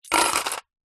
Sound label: foley coins down in metal container